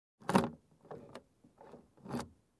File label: Car key turning